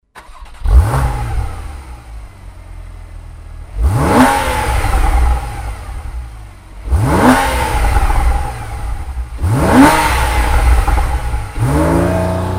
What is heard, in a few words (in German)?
911 carrera motor starten; 911 991